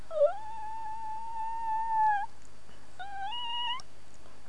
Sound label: whistle